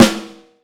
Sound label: Vintage Snare Drum; Q Up Arts; Johnny.C's Vintage Snare Drum; 6.5X14